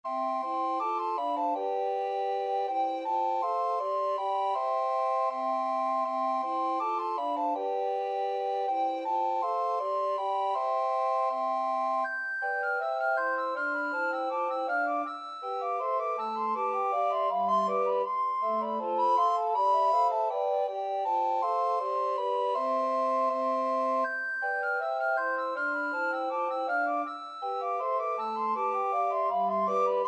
ding dong, recorder quartet

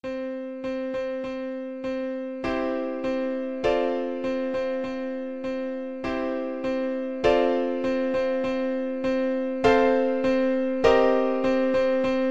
invincible, piano

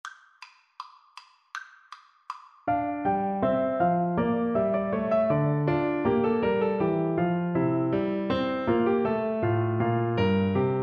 bourree FL kar, Flute

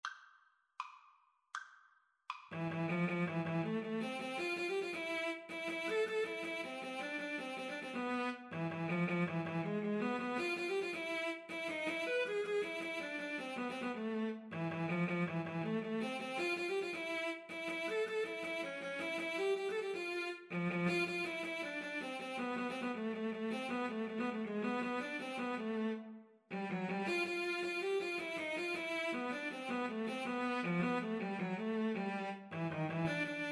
guitar cello, Guitar-Cello Duet

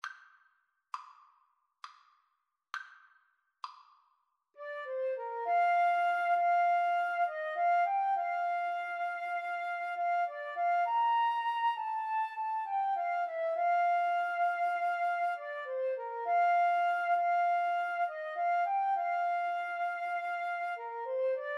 Flute Clarinet Duet

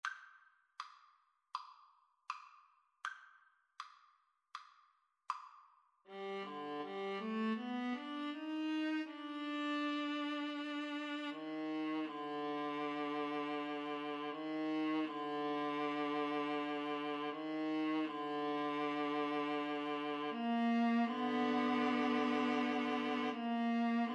Violin-Viola Duet

